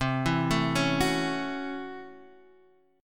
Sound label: A 6th C, A6/C chord; arpeggiated